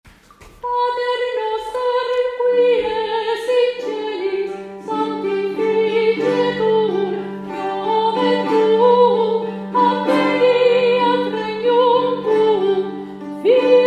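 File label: Pater Noster Soprano Tenor; citysings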